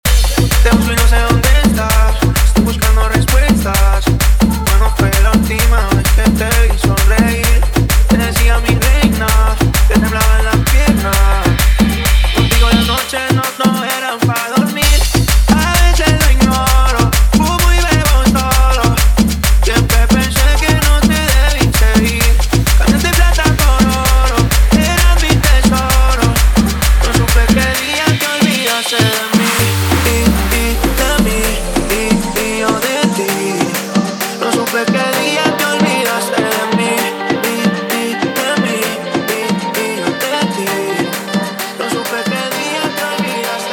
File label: intro outro 130 bpm; Bootleg Remix Radio Mix